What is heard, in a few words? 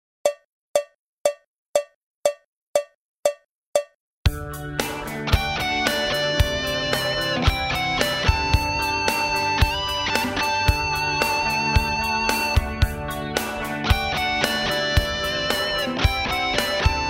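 neck high gain, burstbuckers; 2007 r9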